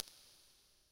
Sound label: tap rev